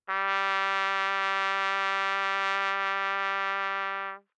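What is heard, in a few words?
Trumpet vibrato ff G3 stereo, Bb Trumpet; MIS Pitches - 2014; Brass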